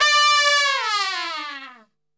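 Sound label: D 4, Brass Super Section, trumpet; Best Service; TRP LNGFLL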